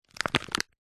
household cup plastic empty squeeze crush; zapsplat

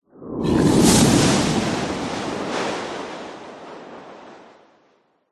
magic dark spell cast powerful wind burst eject; fantasy

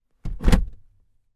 household cupboard hatch door close from inside

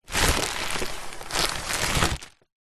foley plastic packaging into cardboard box; zapsplat

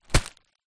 foley plastic bag stuffed with paper impact; zapsplat

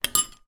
spoon put down in bowl of cereal when finished

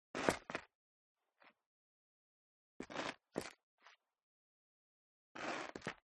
FOLEY STEP Dress Boots Concrete Grit Scuff; smartsound

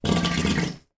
household bath snorkel blow bubbles shallow

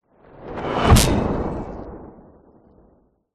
sound design whoosh airy into impact electricity; zapsplat

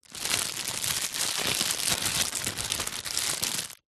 foley plastic pouch small handling; zapsplat

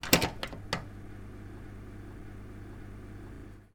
oven electric switch on

